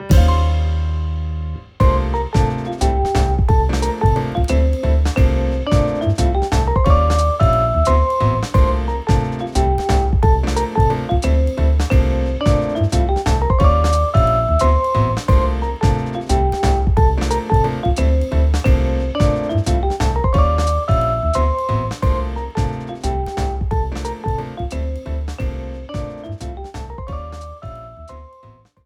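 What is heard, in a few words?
BGM Trump Table Round Result Lose; clubhouse games 51 worldwide classics 2020 switch gamerip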